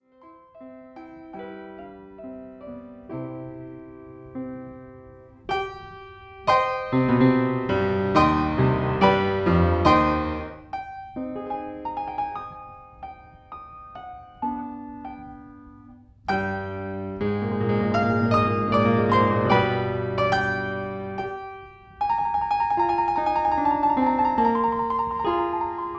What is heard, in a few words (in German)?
Klavierkonzert Es-Dur; welte-mignon authentisch; Edwin Fischer; Wolfgang Amadeus Mozart; KV365